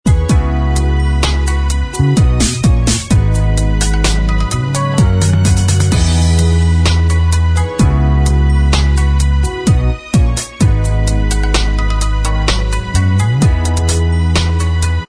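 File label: Instrumental Track, backing tracks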